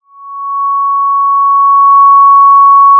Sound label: Sound of a police car siren